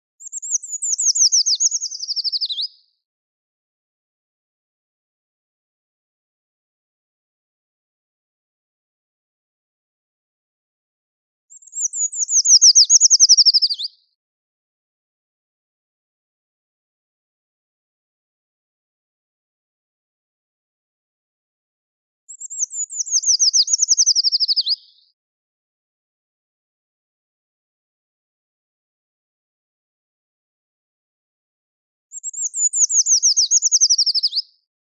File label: Eurasian Treecreeper - Certhia familiaris; Miškinis Liputis